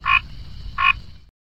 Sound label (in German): frosch